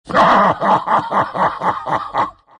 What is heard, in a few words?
laugh; dota; chaos knight